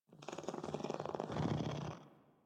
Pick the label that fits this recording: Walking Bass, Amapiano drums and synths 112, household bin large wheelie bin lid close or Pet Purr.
Pet Purr